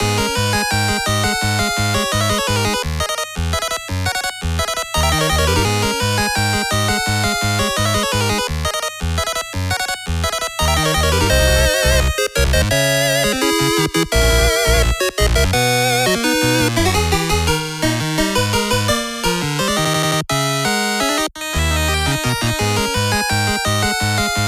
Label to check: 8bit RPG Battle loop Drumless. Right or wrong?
Right